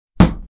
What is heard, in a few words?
Kicking bag karate or taekwondo